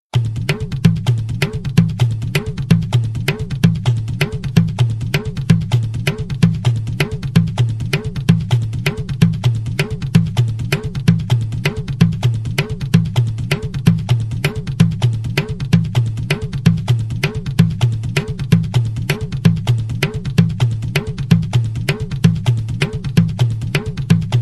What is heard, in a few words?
Instrumental Loop; Sagloops Vol 2